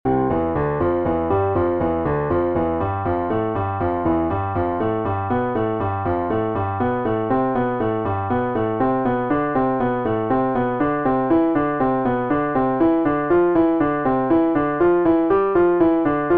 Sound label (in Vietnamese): bai tap luyen ngon piano